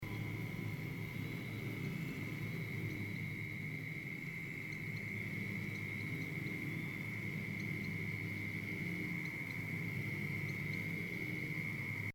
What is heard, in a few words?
Night insects